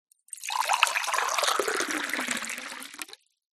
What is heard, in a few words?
food drink pour water into mug from kettle fast